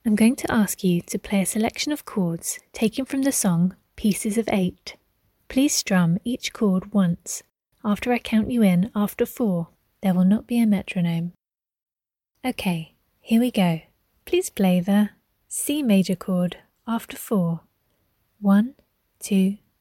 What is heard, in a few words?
chords of c major a minor